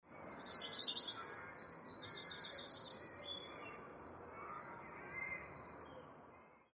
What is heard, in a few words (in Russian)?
penie ptits